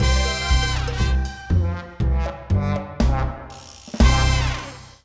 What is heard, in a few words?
achievement; cloning clyde; xbox 360